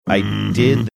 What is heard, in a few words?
deep mmm hmm